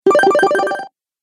Retro Videogame Fx Tag Hit

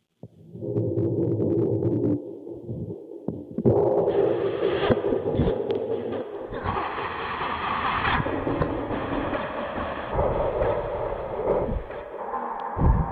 There Be Aliens Outside, alien sound effect

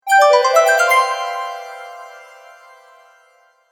News Message, Cartoon

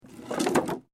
WOOD PLANK MOVEMENT; Material components for constructing more complex sounds; Materials

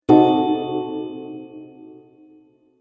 Cartoon Surprise Accent; Cartoon Sound Design Sound Effects

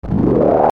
Ascend Chopper Flange; Blastwave

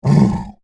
Monster Grunt, Horror, Monster, Grunt, Creature

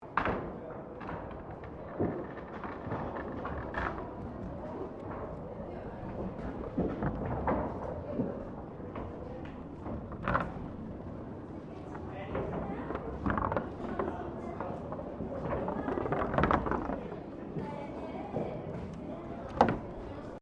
Bowling Alley 18th Century Ball Return; Colonial era objects; Sports

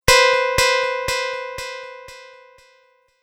Tap Error Delay, Tapping Sound Effects

